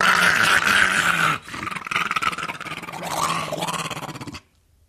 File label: Pit Bull Angry Gargle